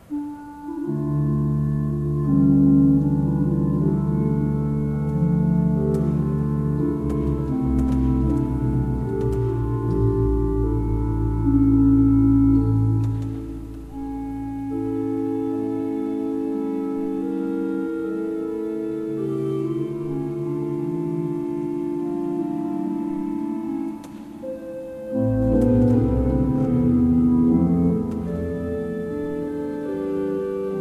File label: Niederlaendische Orgel